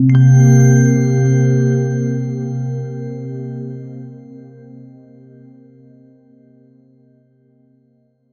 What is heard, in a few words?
game ui sfx when hovering